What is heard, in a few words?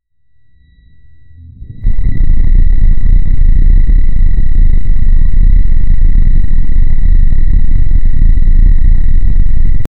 low level energy charging